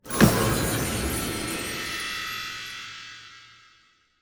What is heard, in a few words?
Dark Eerie Transformation